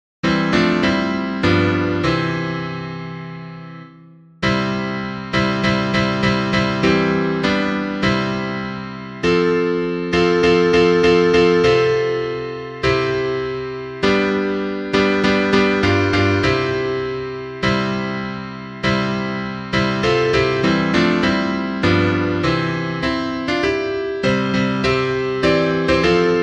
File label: God Be with You accomp; Were You There